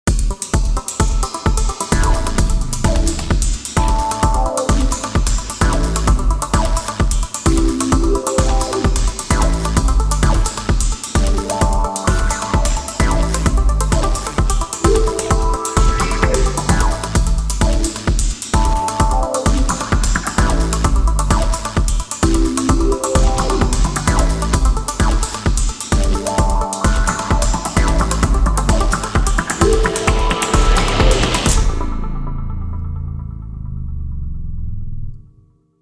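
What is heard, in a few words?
filter party, synclavier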